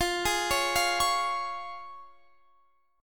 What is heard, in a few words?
F Minor Sharp 5th, F, Guitar, Standard; arpeggiated; capo 2; x,20,18,18,18,21